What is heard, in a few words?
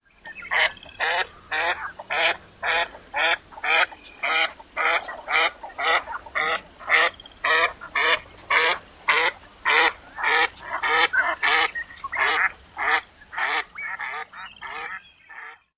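female flight quacks, Northern Pintail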